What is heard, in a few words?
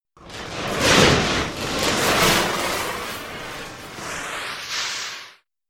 ripper